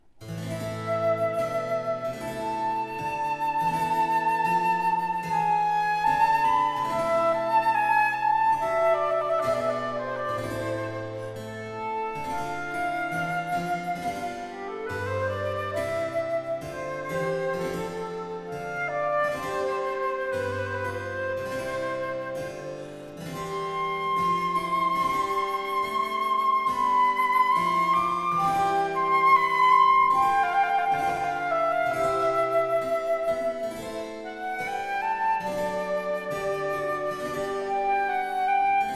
Adagio Sonata in C major for flute and harpsichord; BWV 1033; Bach; Sonate per flauto